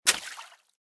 bolt water, material; STALKER; IWP

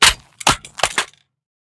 riffle drop gravel; STALKER; IWP

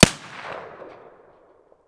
pm muzzle mono far, weapons

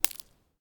Shell 39mm gravel; STALKER; IWP

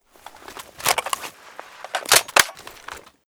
fn2000 reload

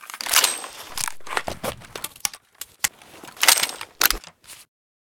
toz66 ammo change; bm16

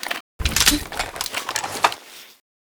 rg6 open

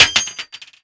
shell heavy metal; STALKER; IWP

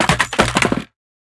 riffle drop wood; STALKER; IWP

